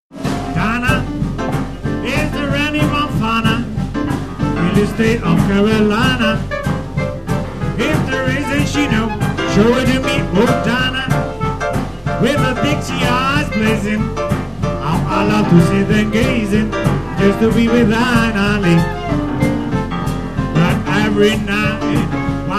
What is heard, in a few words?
Quartet